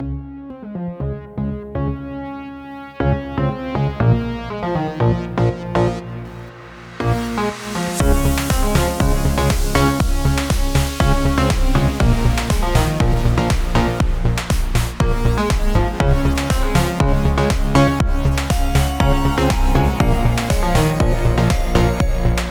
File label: Music; Toad Game A game has been developed with toad as the main character.